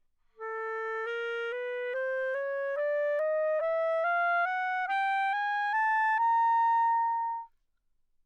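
chromatic ascending, romantic clarinet bb, Anechoic; OPENAIR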